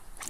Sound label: communication sound inventory